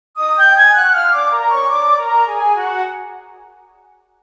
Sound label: Confusing Failure (Orchestral 105bpm); Ultimate Everything Music Bundle; Stingers, Blips and Dings